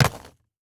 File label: Sports Ball Golf Ball Dropping Into Golf Bag Pocket